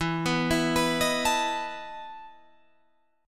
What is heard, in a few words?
E Major 7th Suspended 4th, Guitar, Open F; EM7sus4 arpeggiated